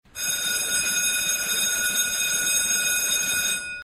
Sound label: ring bell